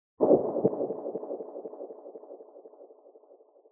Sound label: Library Noise